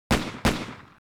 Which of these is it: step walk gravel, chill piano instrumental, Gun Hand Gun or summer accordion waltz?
Gun Hand Gun